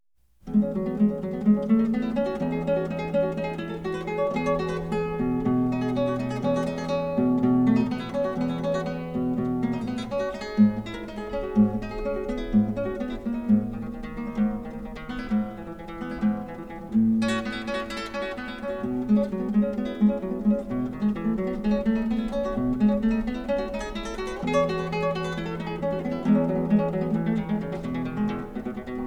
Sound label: Guitar